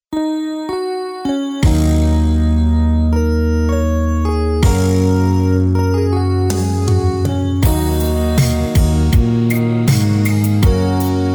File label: beat, playback